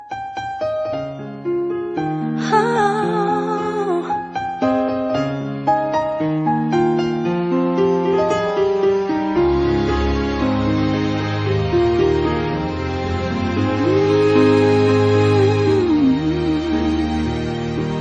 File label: Instrument Tone